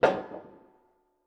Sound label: Metal Strut; 99Sounds; The Warehouse